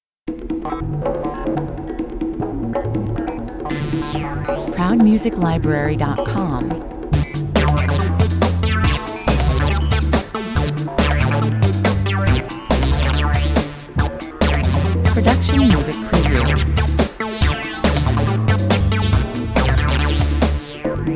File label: synth groove with congos intro and middle section, 140 bpm